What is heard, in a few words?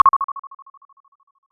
short location sound, digital